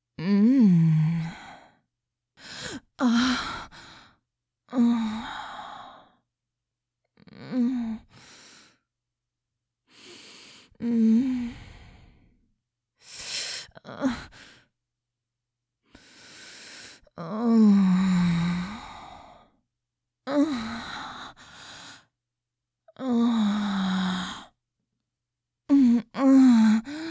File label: Moans Induvidual sounds